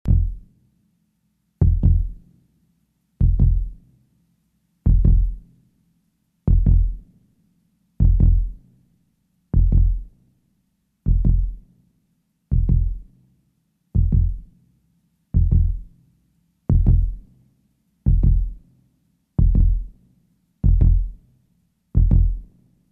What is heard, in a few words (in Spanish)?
latido de corazon